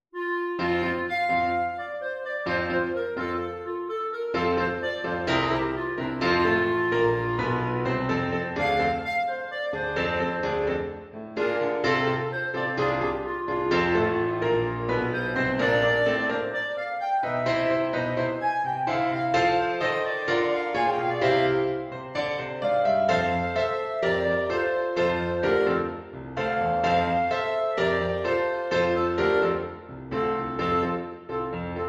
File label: rock Clarinet and Piano